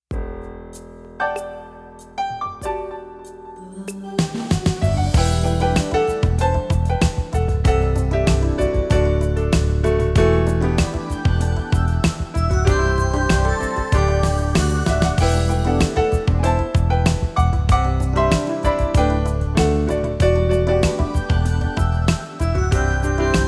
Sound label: Piano, Yamaha PSR-530; on-board demos